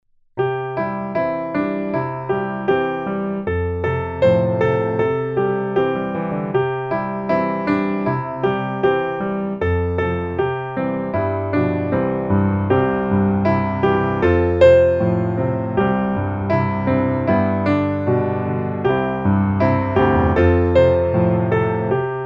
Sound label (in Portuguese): Ha uma parte dentro em mim piano